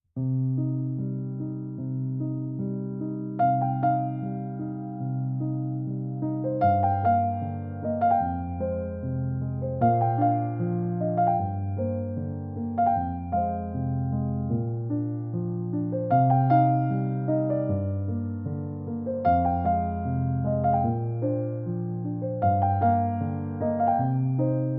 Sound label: Piano Version